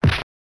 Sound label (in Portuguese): defesa; Ben 10 - Alien Rivals; ThrillGames